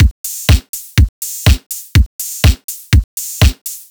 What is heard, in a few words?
Full Drums, 123bpm, Nu Dance Electro; Combi Loops; Zero-G; Soundclash; Acidized Wavs; Italidisco